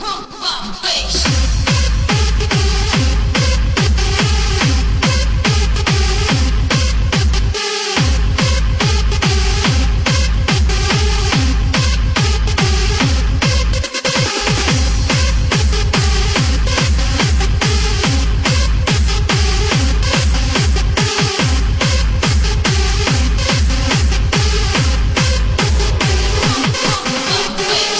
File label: Pump Up The Bass